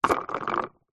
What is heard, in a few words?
leisure toy car wheel spin freely air